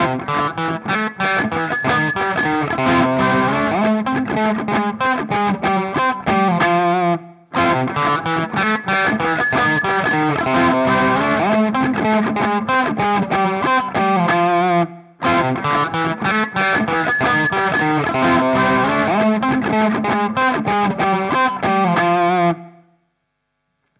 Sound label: Mooer Nux TS Comp; Audiovergleich Mooer Nux